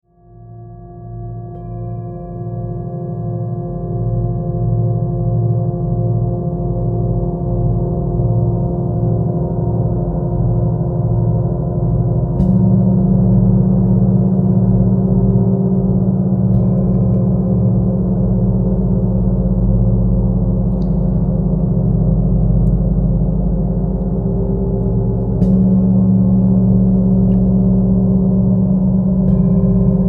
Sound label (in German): Klang Metallidiophone